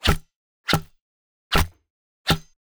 Short Staff

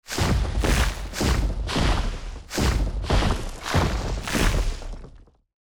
Movement; Zullra

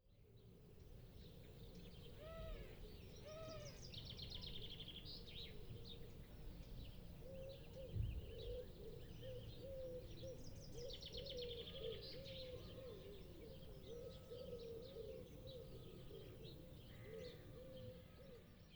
Morning Ambience

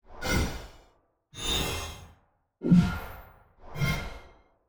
Hover Magic Transition UI

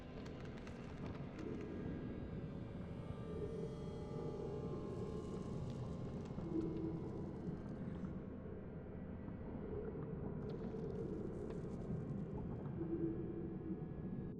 Ice Caves Ambiance

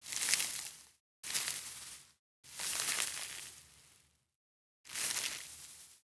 Plants Hit Reacts

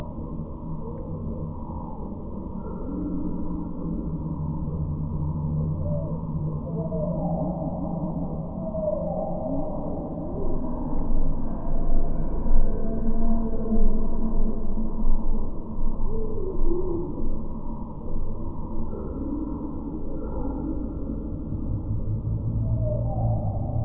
Jungle Cave

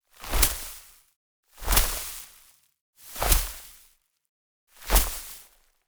Plants Vine Attack

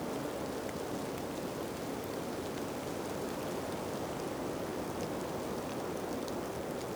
Rain loop for layering

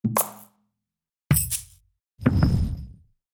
Music UI

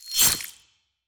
Throatcutter

